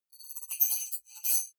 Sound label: digital rattle, signale; soundpool